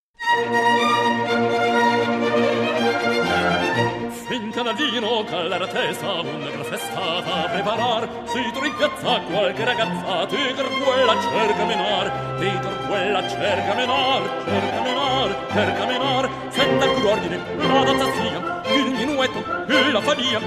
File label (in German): Gesang